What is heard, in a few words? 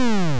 pulse